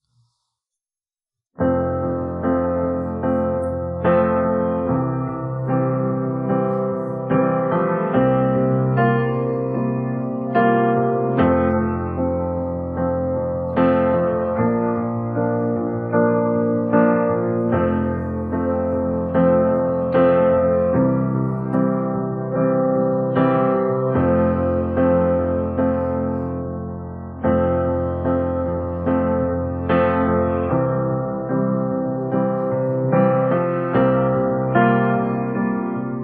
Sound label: accomp